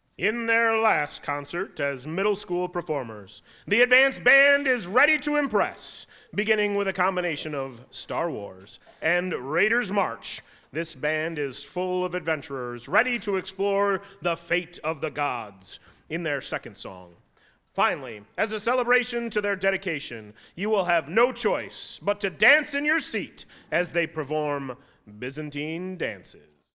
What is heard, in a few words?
Advanced Band Intro